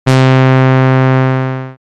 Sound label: Dream Brass; instruments